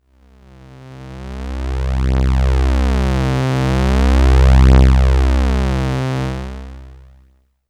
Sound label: Yamaha TG100 Sweep Pd C2